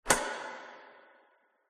industrial light switch warehouse flick reverb

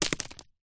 stone small land on dirt road small stones

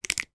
sport swimming goggles elastic adjust

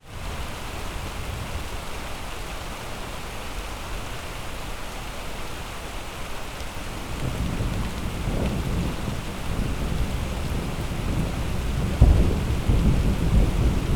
weather rain distant thunder street uk

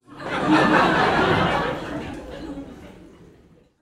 human audience laughter comedy club x200 people

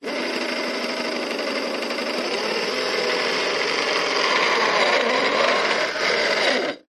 science fiction robot tracks wheels whirr

